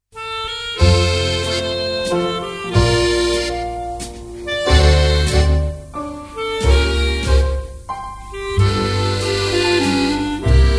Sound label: Waltz